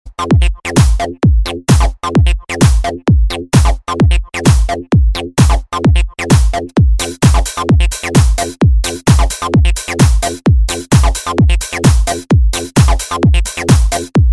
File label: Coolest Ringer